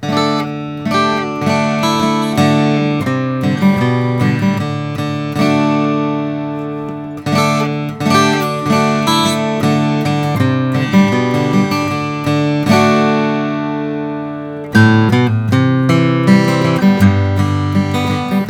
Bourgeois OM 150 aged tone; natural

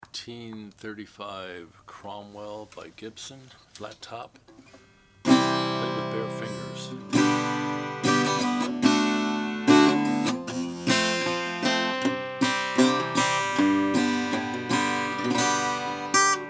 gibson cromwell g2 sunburst

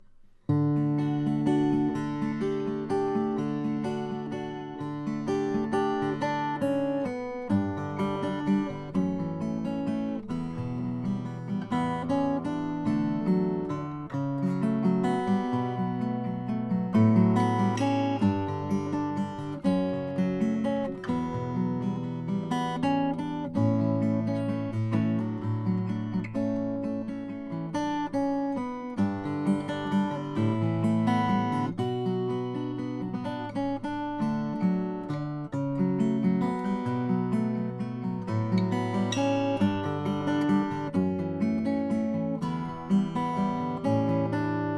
ensor es claro walnut

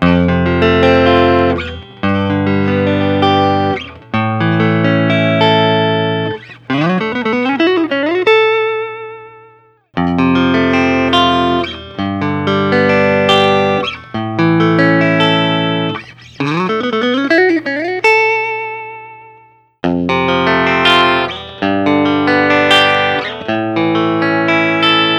Telecaster; kelton swade avrt

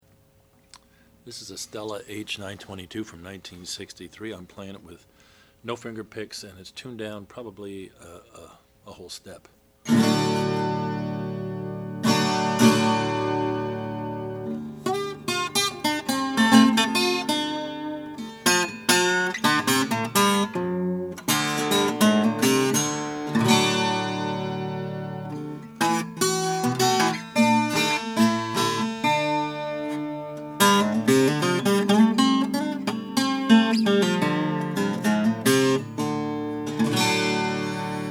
harmony stella h922 sunburst stella h922

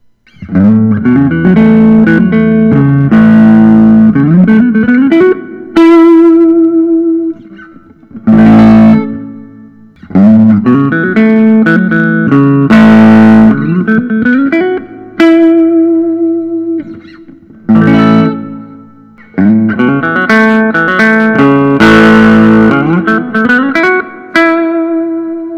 Collings City Limits aged; faded

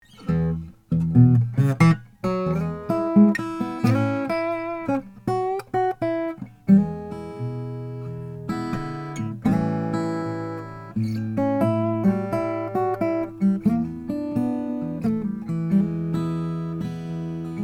tony vines colorado brazilian rosewood

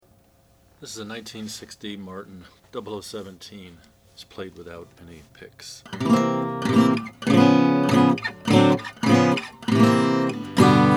martin 00 17 natural mahogany

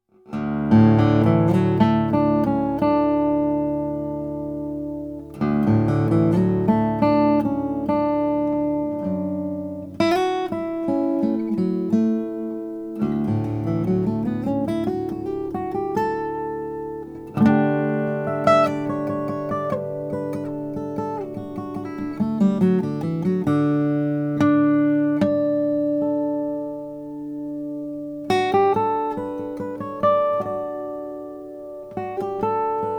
lowden f35c mountain rosewood

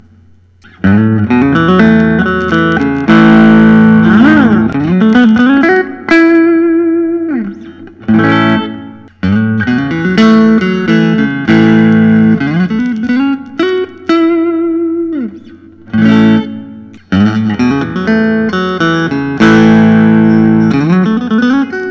telecaster custom; ron kirn signature

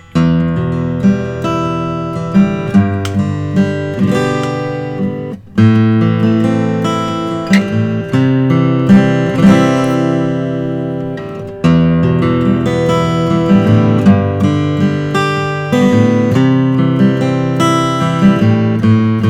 martin custom 000 28 short scale

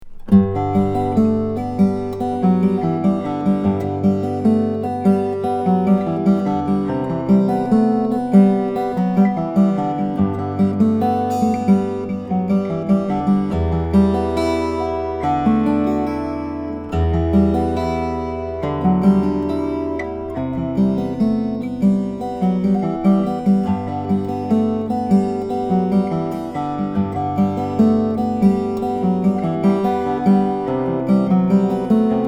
klein 426 brazilian rosewood

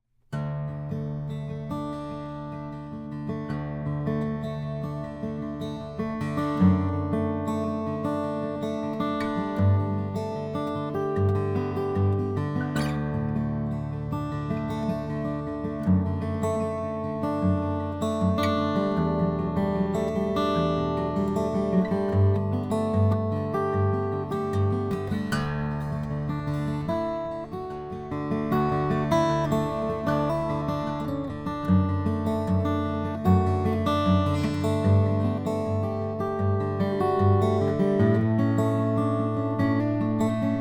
lowden f23 figured walnut

